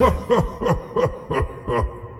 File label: laughing